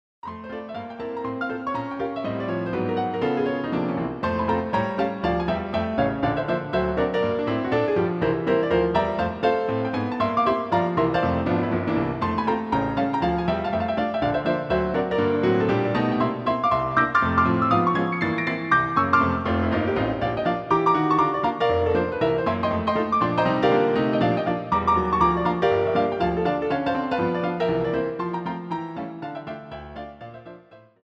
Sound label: Polka